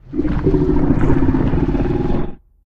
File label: Lava Oneshot; Angry Birds